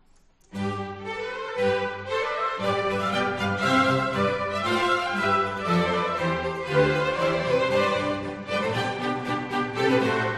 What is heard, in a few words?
Poco Allegro; Johan Helmich Roman; Suita Drottningholmsmusique